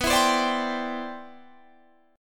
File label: G 6th Add 11th B, chord; G6add11/B strummed